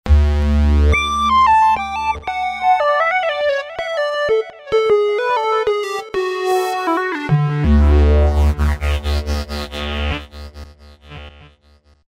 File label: Sweet Square, Roland V-Synth; Factory Patches